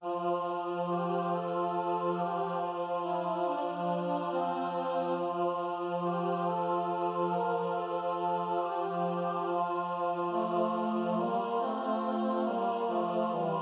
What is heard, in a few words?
SATB